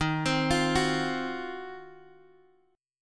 D sharp Minor 6th Add 9th D sharp Guitar Open G, chord